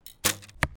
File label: Bow shoot